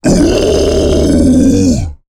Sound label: Scream, Monsters; Lukas Tvrdon; 6Monsters; BlackBoss